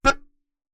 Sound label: Melodica Short Note